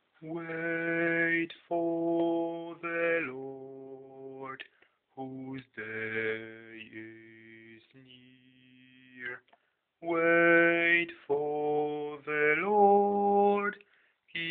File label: Wait for the Lord bas